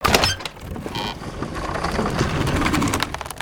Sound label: Fumerol Shutter Close